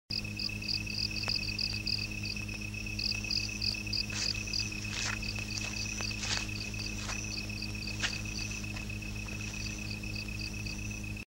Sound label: 3d Night Sound